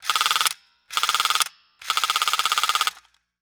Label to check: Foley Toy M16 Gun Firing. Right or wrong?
Right